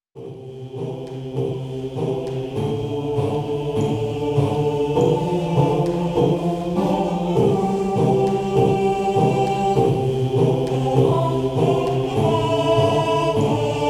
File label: Acapella; Pit People